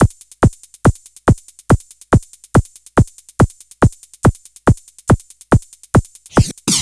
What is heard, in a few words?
loops 130 bpm; SIMPLE MAINZ; Masterbits - Tekkno Trax